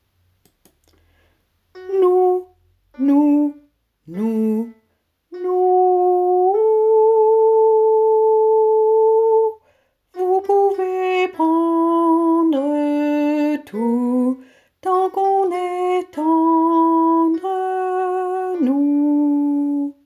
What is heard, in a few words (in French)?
sopranes Refrain